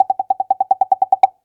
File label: RUN RUN RUN, CARTOON; 1000fx